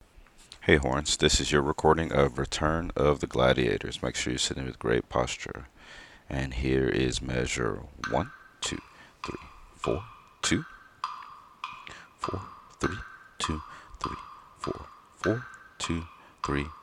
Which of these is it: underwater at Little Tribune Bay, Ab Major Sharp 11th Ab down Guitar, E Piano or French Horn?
French Horn